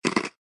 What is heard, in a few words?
Shell Hit; my cute commissar 2020 gamerip